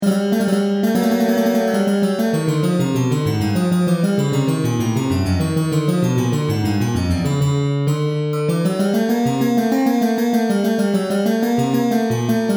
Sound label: Harpsi Mock; Mock Harpsichord; simulations; nemus numerical sound restoration